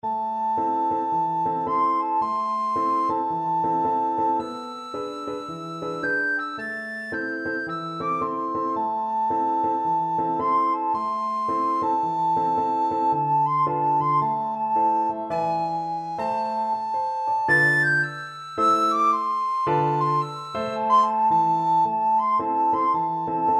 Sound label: wade in the water recorder